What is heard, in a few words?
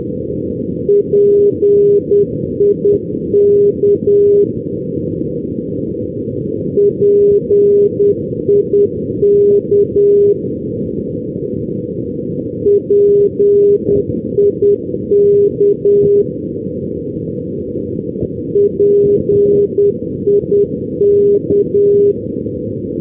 ndb prestwick; europe